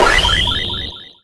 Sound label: add time